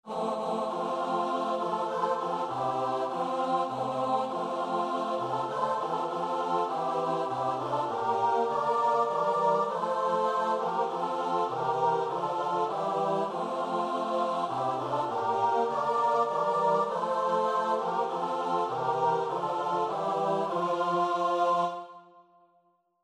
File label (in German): chor klein; Soldat bin ichs gewesen